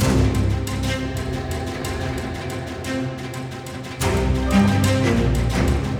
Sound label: Music Jackpot Intro Wait Loop; godsoffortune